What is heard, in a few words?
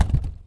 Plastic Thump; BB Retail Therapy Sample Pack